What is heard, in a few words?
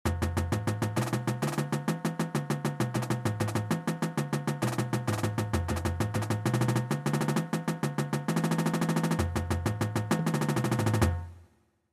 Triplet Diddle